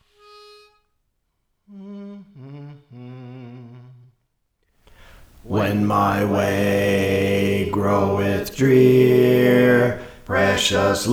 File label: Bass; Praise For The Lord Training